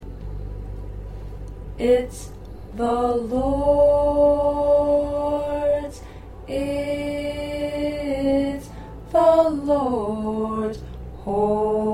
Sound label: Tenor